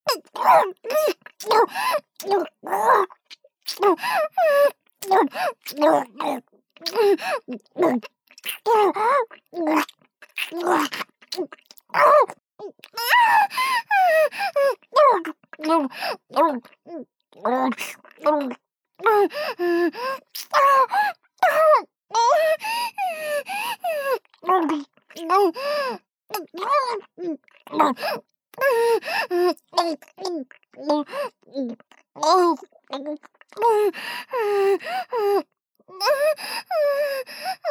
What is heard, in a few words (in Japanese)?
口内射精 ループ